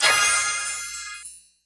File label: Music; Music Frontend